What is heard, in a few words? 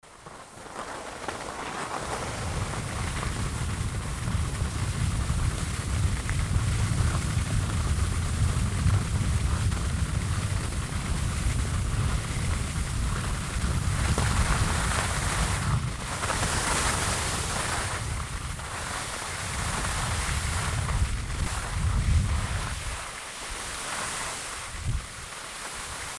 Skiing ski track going down hill